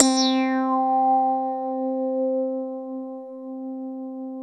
JV-1080

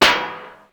Snare, Ensoniq ASR-X Pro

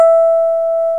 DIGITAL SYNTH; OMI - Universe of Sounds

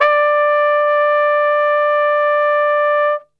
D 2, Brass; Best Service; Brass Super Section

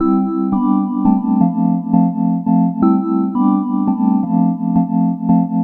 Chime Loop

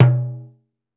Doff Dom, Roland BK-5 Percussion

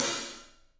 PAISTE HAT, Drums; 3D Sound

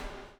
BUBL, BUBBLE; Spectrasonics - Backbeat

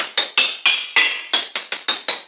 707 mod Tamborine; Sliced

